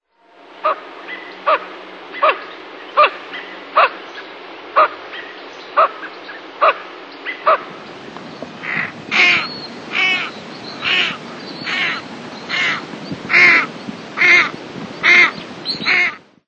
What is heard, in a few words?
Lesser Scaup; Birds